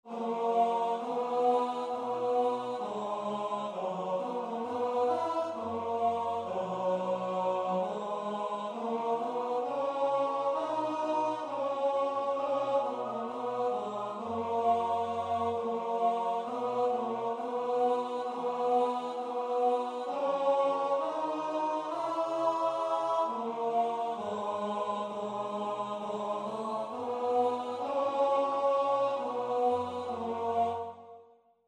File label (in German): komm gott schoepfer heiliger geist nach bwv 370 tenor